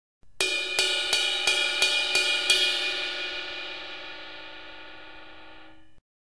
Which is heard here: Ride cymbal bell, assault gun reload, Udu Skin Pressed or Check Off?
Ride cymbal bell